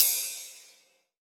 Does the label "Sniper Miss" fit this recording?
No